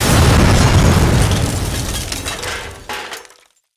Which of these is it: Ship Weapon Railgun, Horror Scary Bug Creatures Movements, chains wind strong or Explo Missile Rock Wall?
Explo Missile Rock Wall